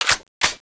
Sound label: clip in, weapons; cso; turbulent11